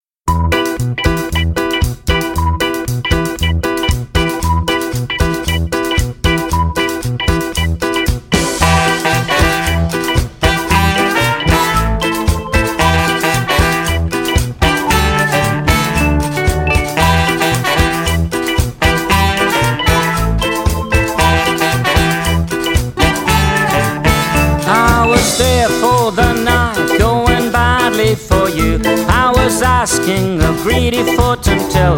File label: Merry Go Round